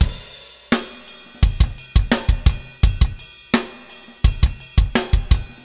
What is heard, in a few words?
Metal Groove Beat, Rock Drums